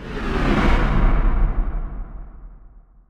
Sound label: echo katana end; weapons; dota; kez